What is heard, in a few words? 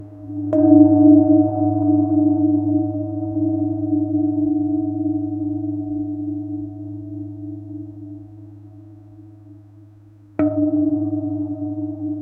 breath gong a gentle, gong